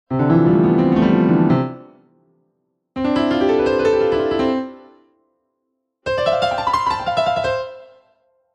Piano Scales, Very fast piano scales from C to C across three octaves; Musical